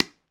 Pickelball Paddle Hit Ground Light, Ball Collision